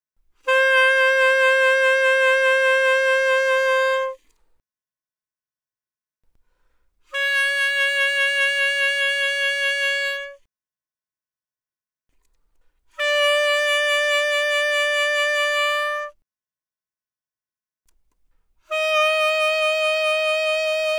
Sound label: Alto Sax Vib ff C5 Ab5, alto saxophone